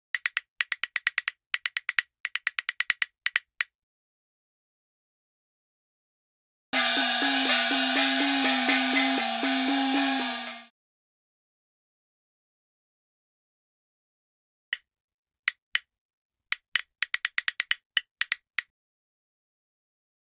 asia percussion

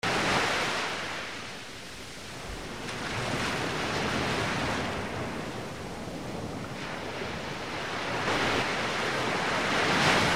atmo waves rolling in

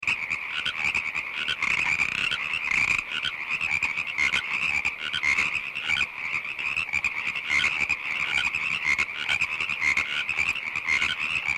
frogs pacific tree frogs

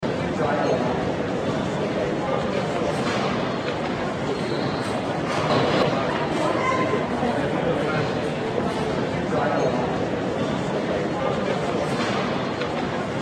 atmo airport large crowd

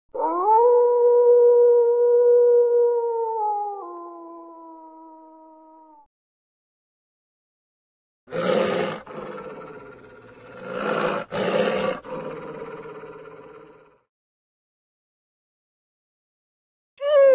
nature coyotes and wolves set

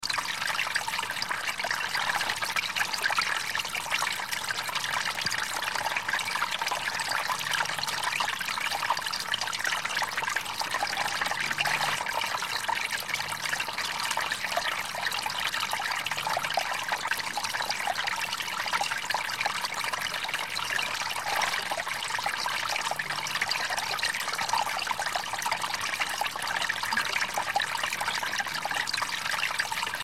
waterfall small pond

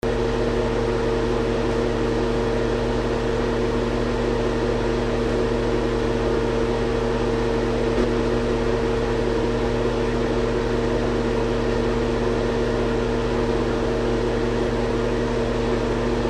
airplane interior

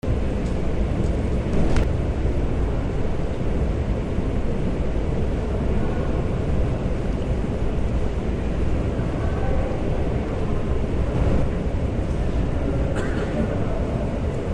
atmo locale courtyard footsteps and voices

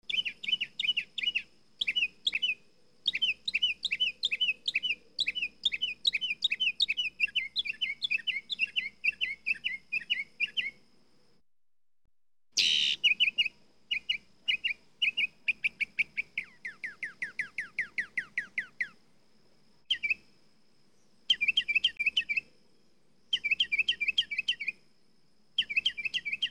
atmo birds mocking bird

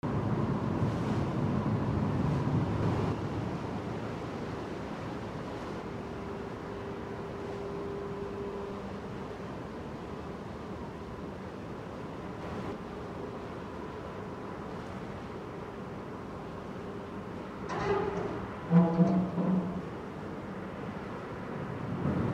harbor water and metal

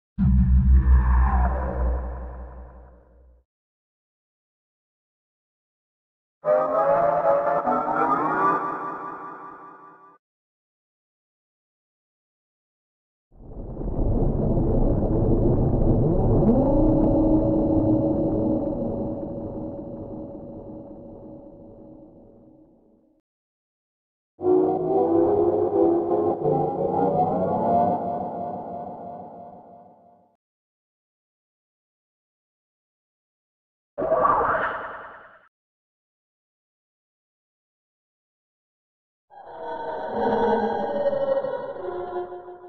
scifi servos set

